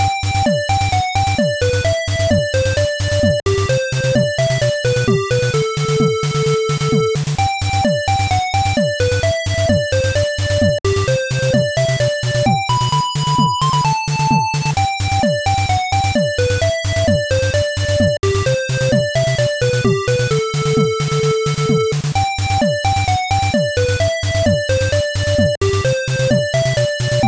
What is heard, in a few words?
8bit airship